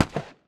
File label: fnfal dist; weapons